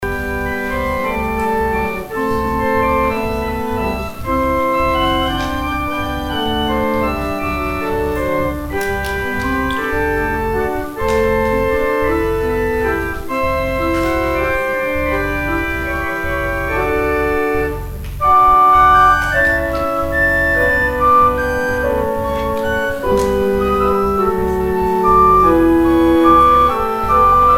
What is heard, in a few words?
organ interlude